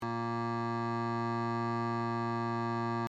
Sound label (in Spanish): gaita